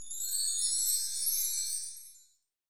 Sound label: MARKTR, ORCH PERC